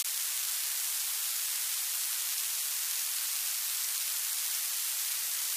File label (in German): funkelrauschen